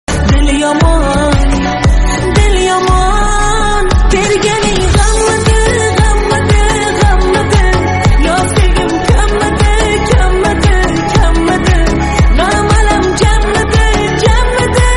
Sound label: awesome turkish song tone ringtone